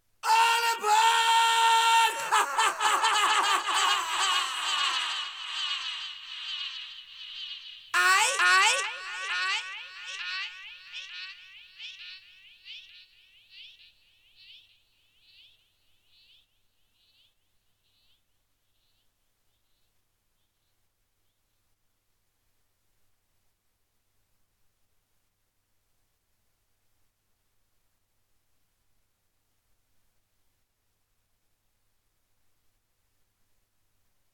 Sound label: vocals